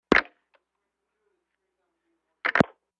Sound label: Telephone in-line pick - up, handling noise, hang - up; futz movement